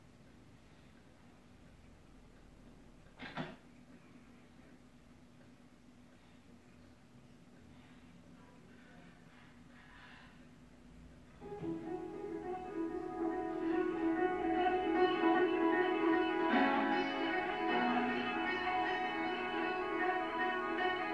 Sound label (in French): cloches; Las Palmas